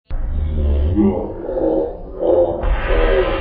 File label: wahahahah